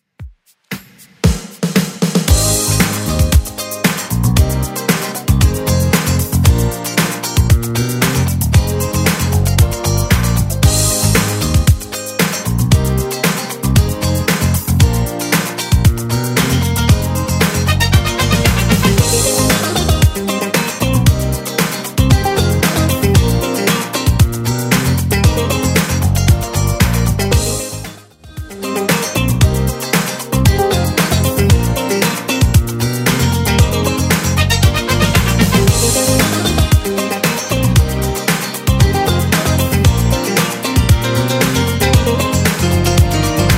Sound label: 70s Redrum 115bpm